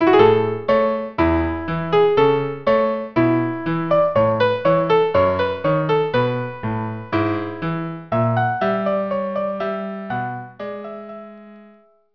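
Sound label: violin cello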